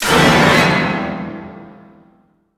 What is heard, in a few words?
String Medium